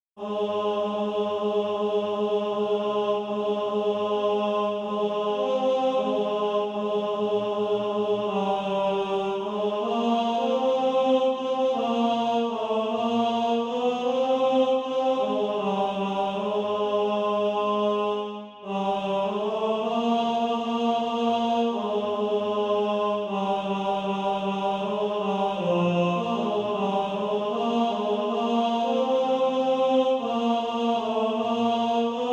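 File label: tenor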